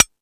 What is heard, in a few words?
Beat Box; E-MU Pro-Cussion